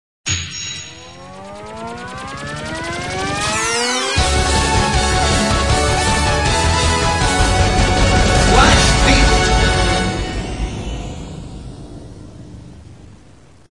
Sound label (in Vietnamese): nhac nen mo dau chuong trinh soi dong va hap dan